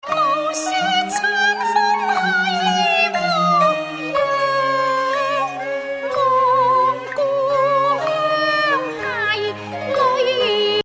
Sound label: Opera 48kHz, Aliasing Examples